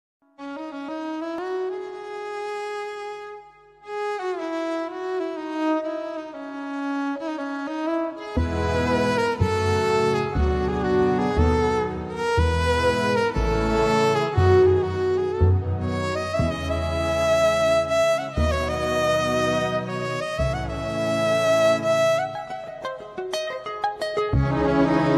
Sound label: bgm